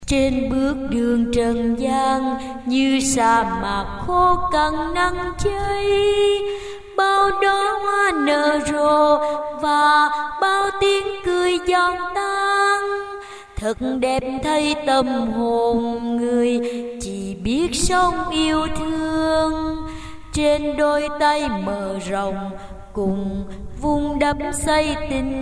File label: basso tenor